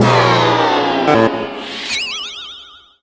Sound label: puzzle failed